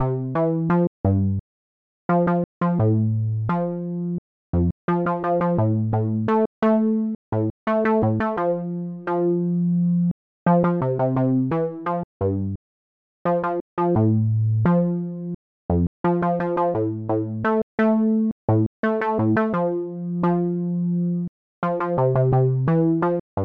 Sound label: MS-20; Metric Halo v4